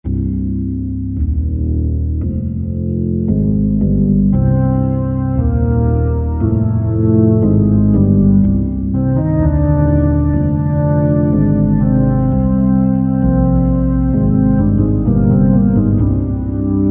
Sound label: Bass Pedals - 16' Slow Leslie - with Medium Distortion, Rock Keyboards; Greytsounds Philip Wolfe's Rock Keyboards